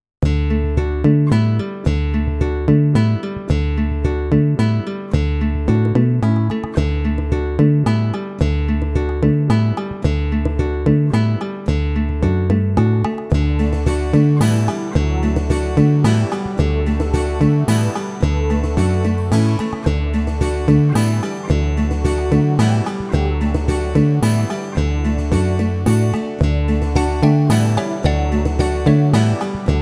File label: Karaoke